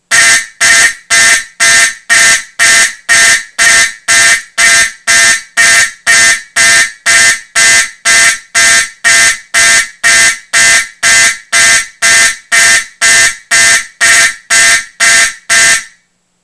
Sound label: Simplex March Time